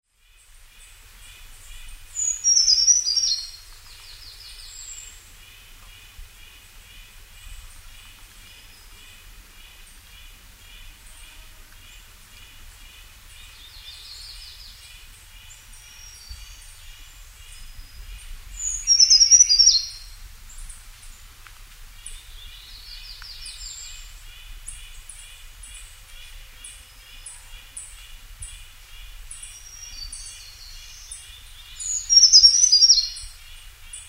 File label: brown creeper